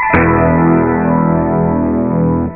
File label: Dramatic; warsamples